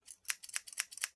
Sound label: office scissors cut air fast x4; sound effects one